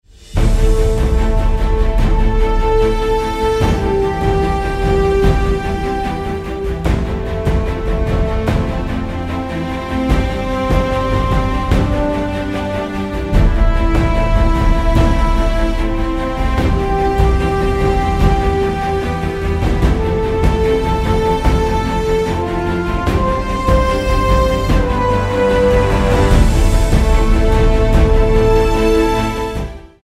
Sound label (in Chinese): 专题片背景音乐